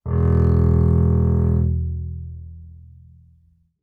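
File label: Bass arco fortissimo sul E E1, Double Bass; MIS Pitches - 2014; Strings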